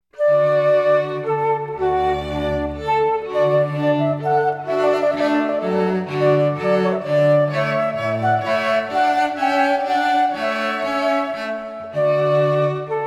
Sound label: menuet; royal fireworks